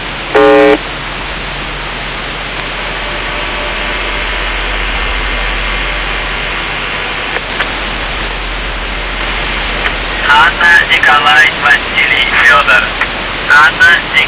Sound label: buzzer usb msg